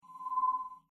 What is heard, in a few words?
section Enter; starwars; episode vii the force awakens